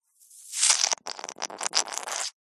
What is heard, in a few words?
liquid foamy bubbly squirt weak bubbly dribble